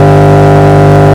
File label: camaro off high